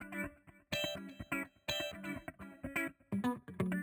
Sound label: 125 E FX, MELO + FILTER; Saturn; RoBKTA Sample Pack Supreme